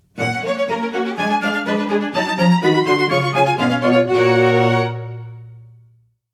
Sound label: Alarm